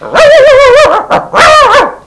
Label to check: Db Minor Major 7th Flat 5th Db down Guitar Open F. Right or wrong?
Wrong